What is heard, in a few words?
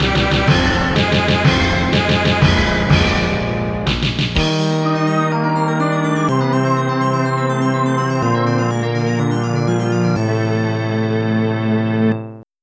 Stage Clear; Ghost Pilots; Neo Geo CD